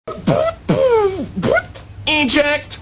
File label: eject robot